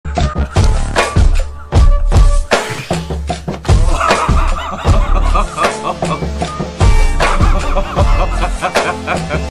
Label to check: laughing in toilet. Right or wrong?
Right